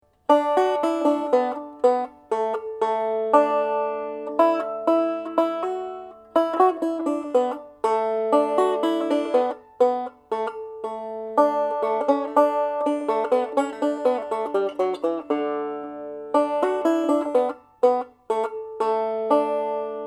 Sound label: Arkansas melody